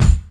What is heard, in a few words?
focused steel kick drum sound e key